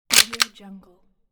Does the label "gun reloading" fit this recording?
Yes